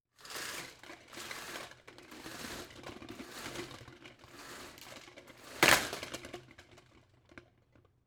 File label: Household Blinds Open